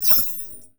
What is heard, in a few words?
rescue cat